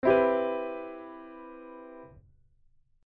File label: closed voicing